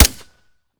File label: fire sup, glock; weapons; Urban-Coalition; Decay; arccw ud